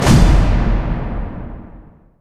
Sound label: Stinger (Slam)